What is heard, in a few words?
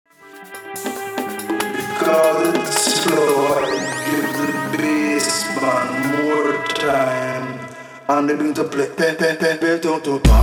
Dubstep